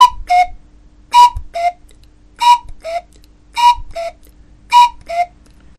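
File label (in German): Kuckuckspfeife mit Vögel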